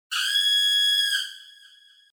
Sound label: scream; 叫び声(恐怖)1